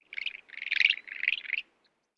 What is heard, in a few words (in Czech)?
mravenečník anteater